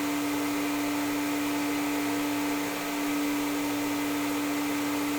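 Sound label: Vacuum Idle loop; Rabbids Coding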